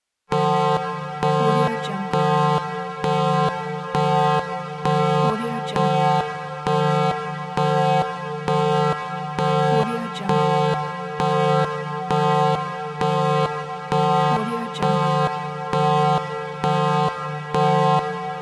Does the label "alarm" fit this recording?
Yes